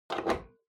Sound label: Pendulum Clock Hardware Key Insert